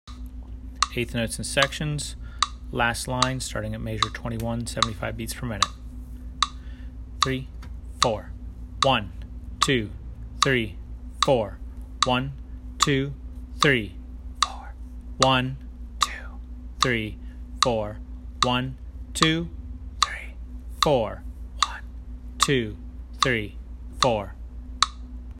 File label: Quarter Notes Sections Last Line 75BPM; Drum Lessons